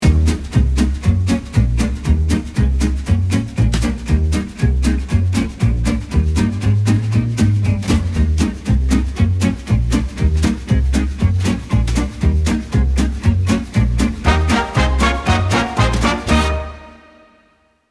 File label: music